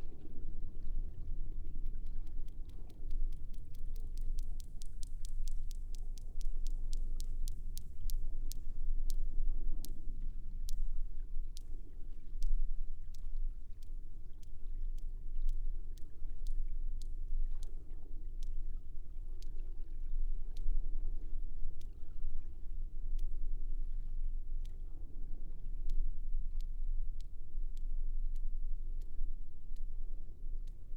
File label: Sperm whale clicks; cachalot